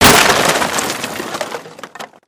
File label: wood crash